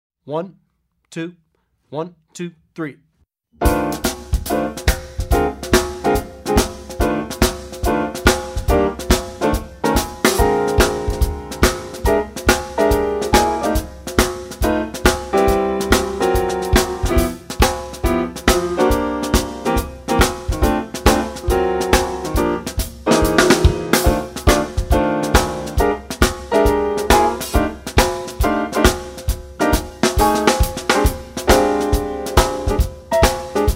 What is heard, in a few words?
Jazz Bass Blue Note Blues without bass soloist; Effective Etudes For Jazz Bass